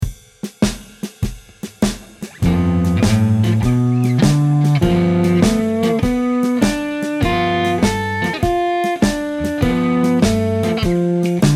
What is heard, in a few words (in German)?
Bm7b5 Arp tief